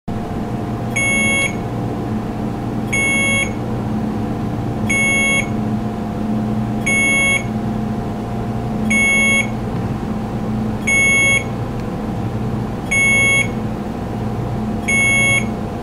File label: Alarm